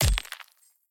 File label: pick axe